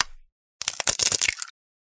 short egg cracking

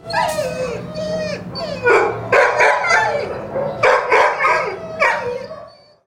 Dogs Whining and Barking

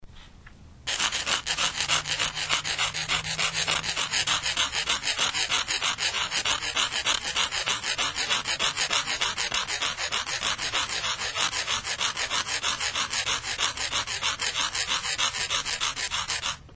scratching; lisa

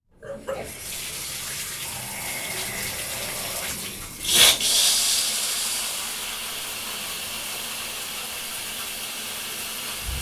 Person Taking Shower

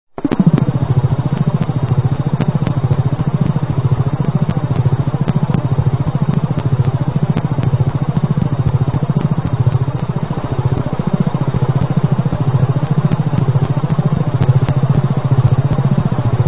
inside spaceship

